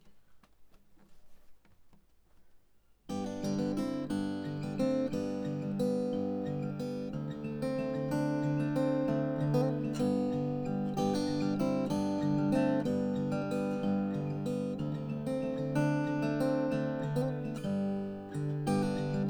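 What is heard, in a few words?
Acoustic Guitar Arp Bridge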